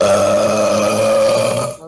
arroto; sauna

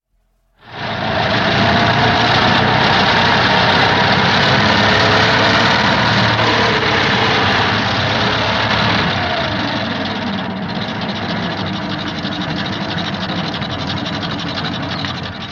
carro desligando

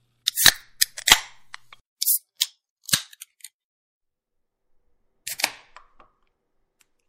abrindo latinha

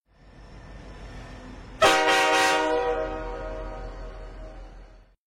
trem

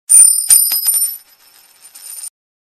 metal caindo

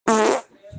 peido

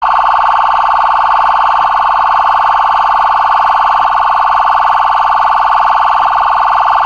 sirene policia federal

